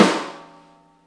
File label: decayer, snares real